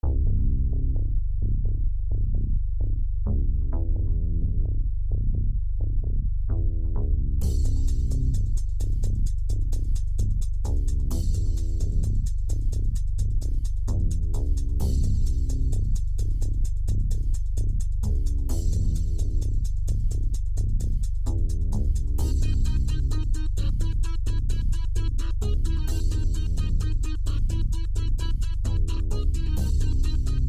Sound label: dark drum